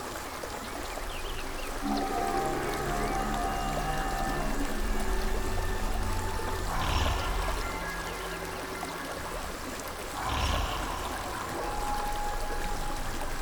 Amb Spirit Trials; bloom minigame

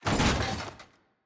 Box Put Down

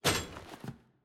Chomper Kick; Puzzle

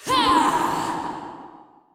Enemy Boss Attack Medium; bloom minigame

